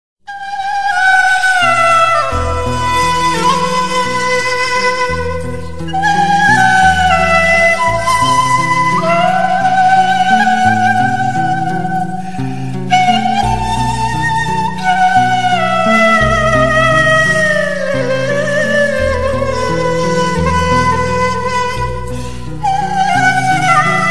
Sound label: arabic; AlKwaser